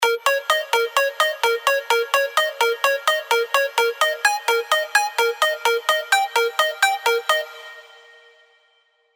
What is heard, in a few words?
PROG ARPS A#m